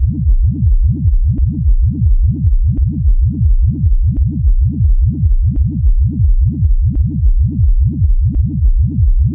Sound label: techno